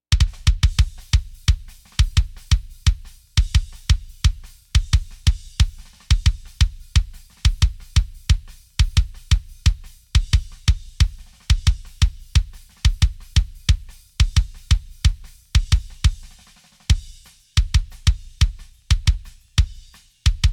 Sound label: Kick Drum; Multitrack